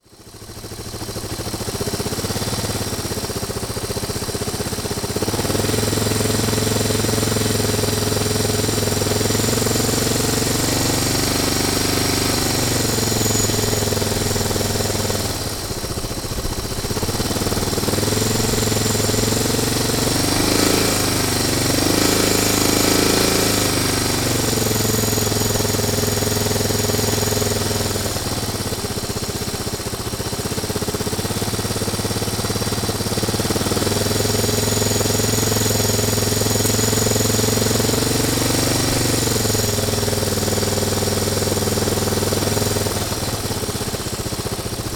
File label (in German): Klingeln; DR650